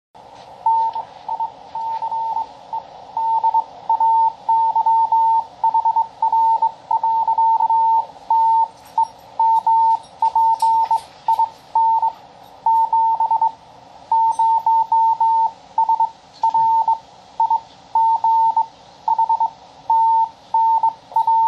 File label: bug sample, cw